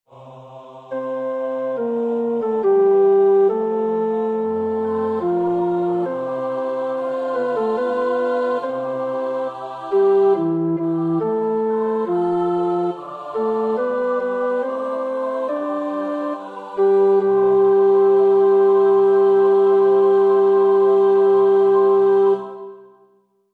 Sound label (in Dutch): tenor, koorpartij oefening; Schütz (Heinrich); Matthäus Passion SWV 479